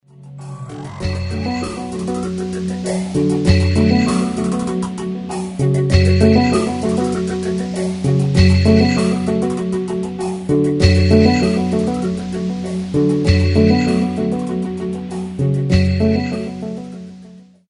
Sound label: Ringtone